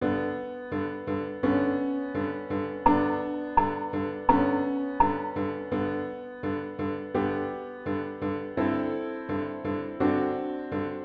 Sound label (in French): violon